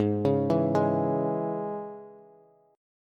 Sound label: Ab Suspended 4th Sharp 5th Bass; arpeggiated; JGuitar